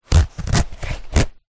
foley wetsuit zipper short bursts stuck

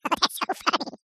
cartoon laugh high pitched says thats so funny

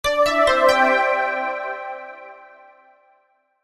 multimedia signature corporate

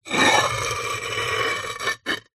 household pot ceramic base lid plate scrape

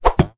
arrow shoot hit target

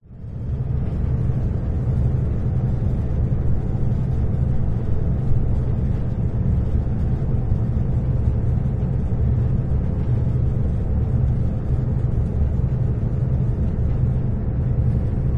boat ship ferry toilet ambience airy vibration; vehicles; zapsplat

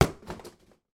person punch or kick cardboard box; foley

